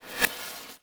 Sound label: Transition Reverse Surge Reverse; Production Element